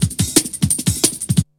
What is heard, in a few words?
BREAKBEATS, TECHNO